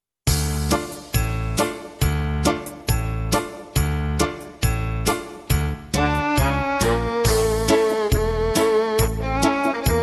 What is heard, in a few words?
brass minus